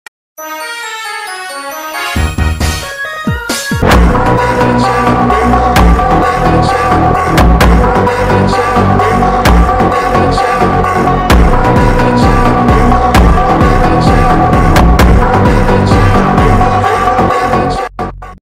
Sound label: funk